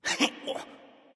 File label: Grunt; smite; loki